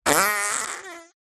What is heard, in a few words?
cartoon fart squeeky